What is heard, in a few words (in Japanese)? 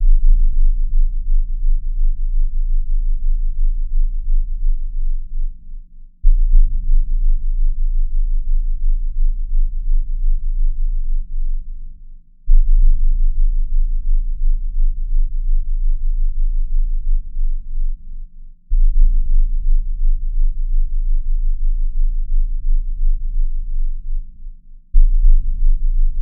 20Hz～30Hz 22Hz 26Hz θ波; 性依存症; 4θ波